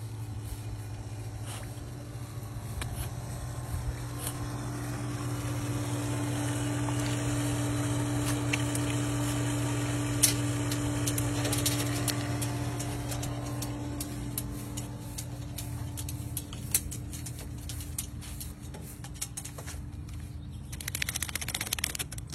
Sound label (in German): Hund im Pool